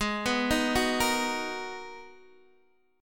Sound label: G sharp Minor 9th G sharp, Guitar DADGAD; x,11,9,8,9,8